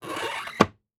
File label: Household Drawers Dresser Drawers Close